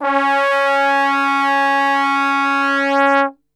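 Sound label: C#2 trombone; Best Service; Brass Super Section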